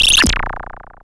FX, attacks

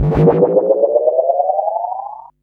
bass delay fx; EZRollers

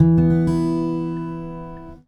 Bug Bug Stop Gtr, Guitar Only; Sonic Foundry (Sony Creative Software); Friendly Fire

